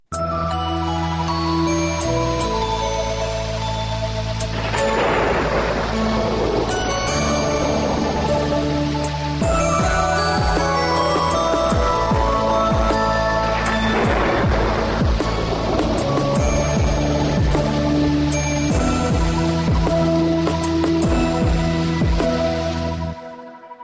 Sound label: Rain; Nokia